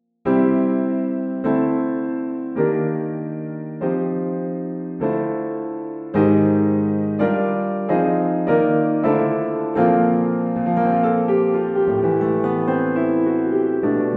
Morph Bluethner to MK1, Gradually Morphing Instruments; Pianoteq; Modartt